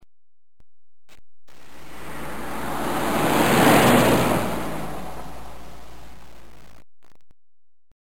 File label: car